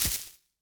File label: Footstep Grass; Opsive; UltimateCharacterController; Footsteps